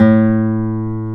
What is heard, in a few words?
guitar classical guitar, nylon string; Roland LCDP02 Guitar and Bass